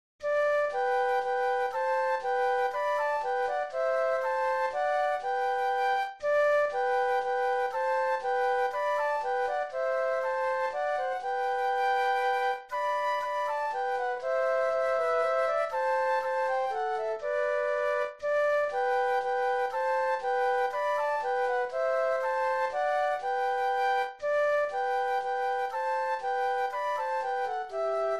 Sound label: Flute Duet; christmas